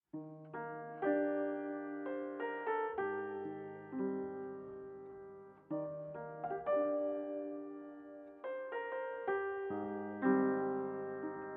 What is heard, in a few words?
tune